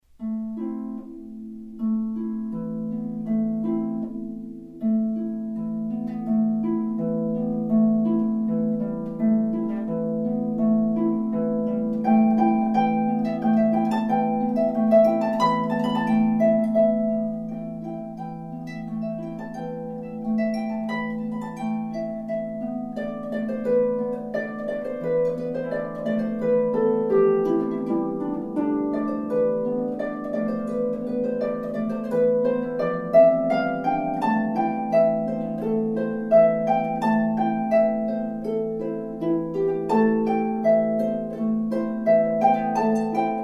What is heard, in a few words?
Four Miniatures for Harp Elven Dance